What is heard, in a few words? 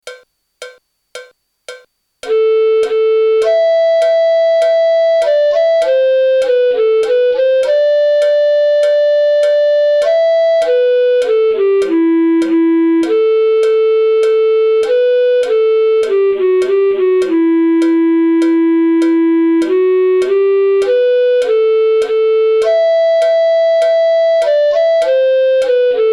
Melody